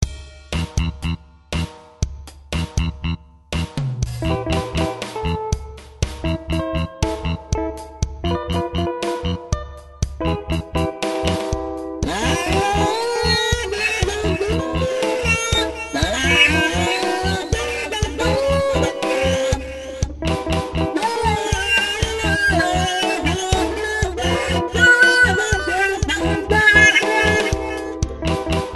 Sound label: kazoo; I bought a toy kazoo; toy kazoo, Yen 210; KUSO Grade ***